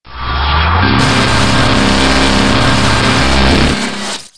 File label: laser long